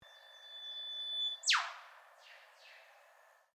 Bird Eastern Whip Bird Call, Birds in Queensland; Birds (Sound Effects:Animals)

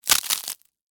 Texture, Crack, Crunch, Ice, Cream, Cone; Food; Household

